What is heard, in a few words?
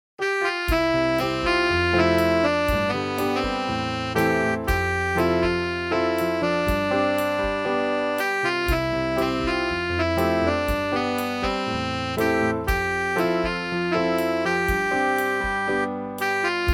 there is a fountain instrumental